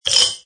Metal Jar Rattle; Metallic Sound Sound Effects; Multimedia; Lynne Music

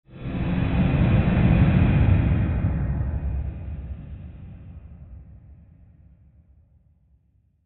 Swell Airy Rush Rumble; Sound Design; Swells; Big Room Sound

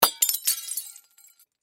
Crash Glass Light Bulb Break Debris; Glass (Sound Effects:Materials); Blastwave

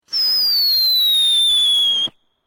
Fireworks Skyrocket Screamer, Domestic Fireworks

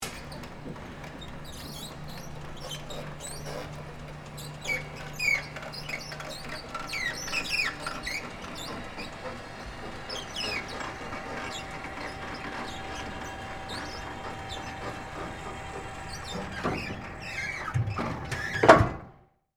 Industry, Hi, Lo, Lift, Down; Machinery; Machines; Blast Wave

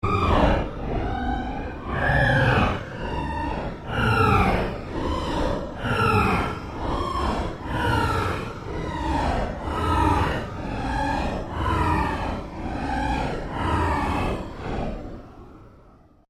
Created Cyber Whale Sequence, Alien Sound Effects; Created creatures, vocalisations and pretend speech

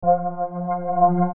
Logo Stereo Pulse; Sonic Logo; Blastwave

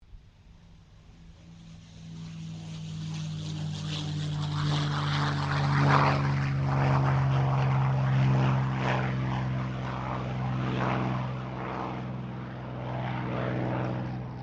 AIRCRAFT CA-18 MUSTANG TAKEOFF; P51 /CAC-18 Mustang; Airplanes; Vehicles

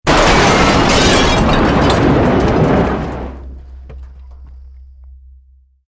Building Collapse Medium

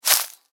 Footstep: Individual Footstep On Dry Leaves

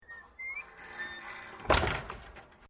Door Wooden Gate Old Squeak Slam; Various doors; Gates

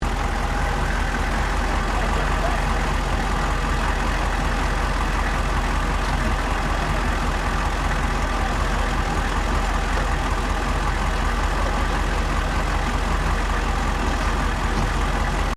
4WD HUMVEE IDLE, US Airforce model Humvee; Trucks

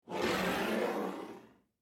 Foley Glass Slide Across Countertop Left To Right; Kitchen

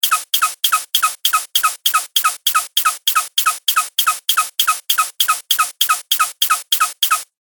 Cartoon Repetitive Pump; Cartoon Sound Design Sound Effects

